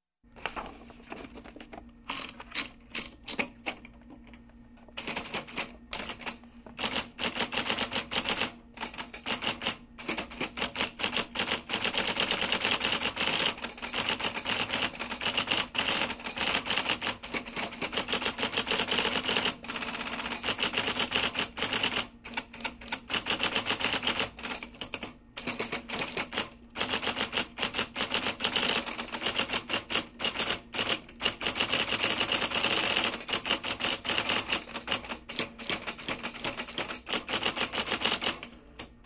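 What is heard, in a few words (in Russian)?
печатная машинка телетайп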